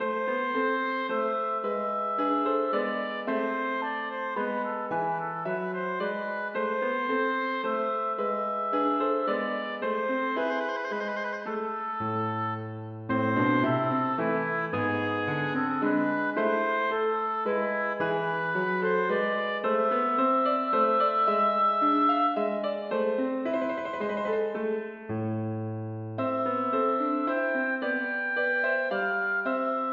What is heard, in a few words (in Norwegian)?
Menuet for obo og piano; Aamodt